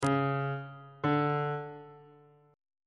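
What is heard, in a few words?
d flat perfect 1 augmented 1 intervals on bass clef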